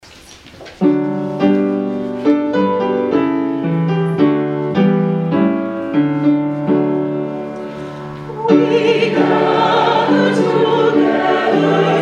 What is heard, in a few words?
Hymn; We Gather Together